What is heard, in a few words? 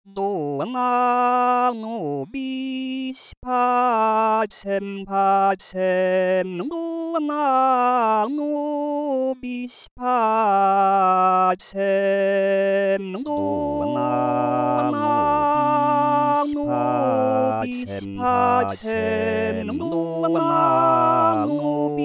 dona nobis canon; Rostock